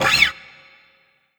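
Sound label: Ensoniq ZR-76 Fret Noise GM C3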